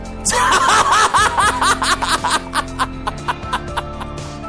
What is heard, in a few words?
ork laughing